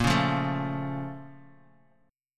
Bb Minor Major 7th, Guitar; BbmM7; strummed; JGuitar

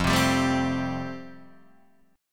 F Major Add 9th, Guitar Open D

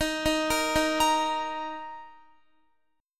D sharp 5th D sharp, Guitar; arpeggiated; JGuitar

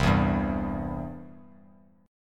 C Major; strummed; JGuitar

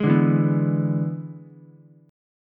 E 7th E down Ukulele; E7 strummed; JGuitar

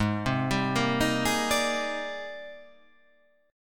G sharp Major Sharp 11th, chord

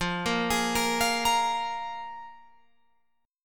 F Minor Double Flat 5th, Guitar, Open F; Fmbb5 arpeggiated; JGuitar

